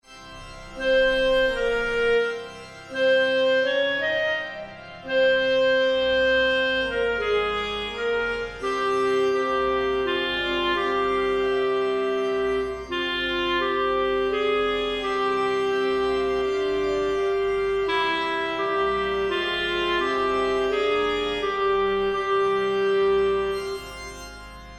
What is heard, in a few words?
Sanctus Alto; stmarysbruton